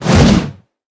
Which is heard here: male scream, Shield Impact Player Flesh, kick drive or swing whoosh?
swing whoosh